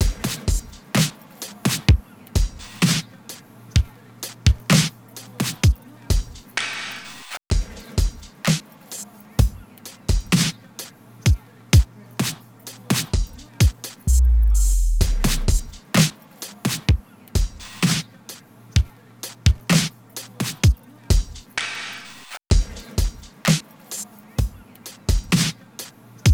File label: Drum Study